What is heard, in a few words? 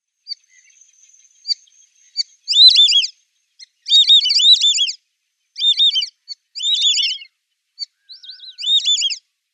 White-faced Whistling-Duck